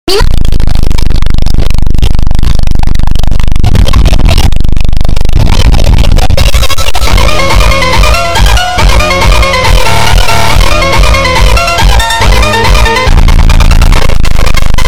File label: FUNK bassboost